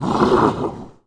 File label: fall; monster; yellow tigerman